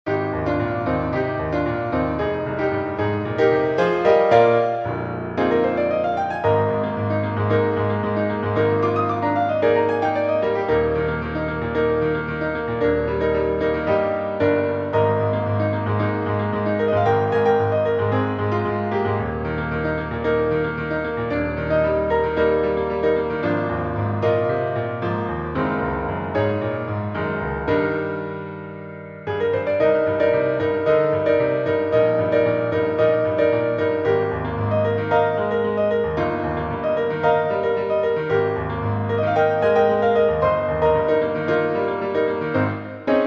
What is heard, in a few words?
piano accompaniment; audition